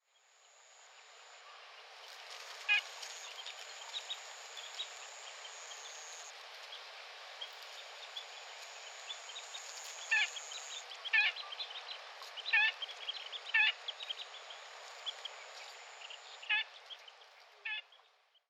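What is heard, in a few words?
Black-winged Stilt, hyogo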